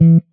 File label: marimba; ゆめ2っき